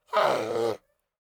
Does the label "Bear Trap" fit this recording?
No